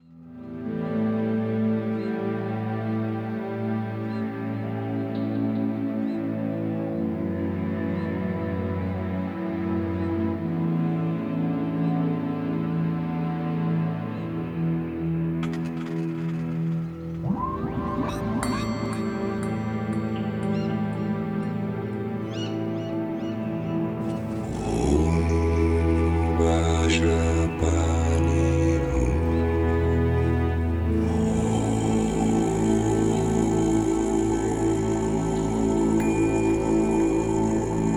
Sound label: Om Mani Padme Hum